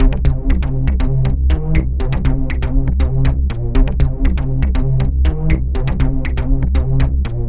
Curve FM Bass; Sonic Foundry (Sony Creative Software); The Electronic Music Manuscript Collection; Bass Foundations

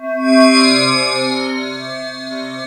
ENSONIQ VFX PLATINUM; Rubber Chicken Software Sample Bank; VFX 1

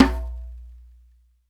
DJMB OP, DJAMBE, Africa

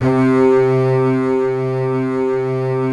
C BASS C3, SOLO; Greytsounds VOL-1

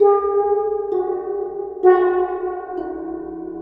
Waterphone Soft Mallet; Sonic Foundry (Sony Creative Software) - Ambient Atmospheres & Rhythms; Ambient-Metals

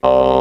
Bass Box Vowel O; Mo' Phatt; Digital Sound Factory Mo' Phatt

